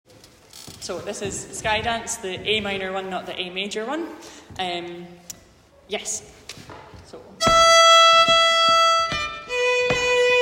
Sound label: Skye dance minor, fiddle group; Skye dance minor one